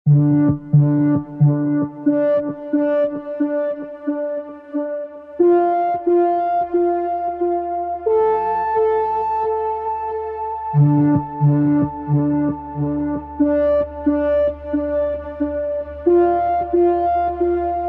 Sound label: Electronic Variation